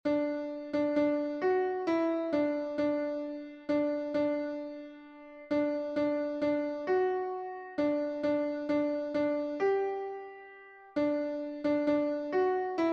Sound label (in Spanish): CONTRALTO Piano; cantos del camino